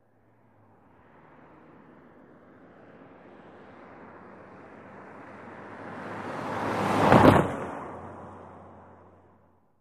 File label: Car Tires Over Rail; tires over railroad